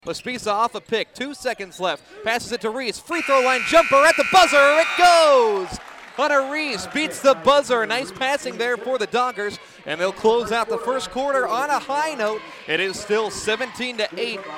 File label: beats the buzzer